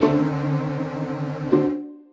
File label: orch sting; musicradar; cartoon caper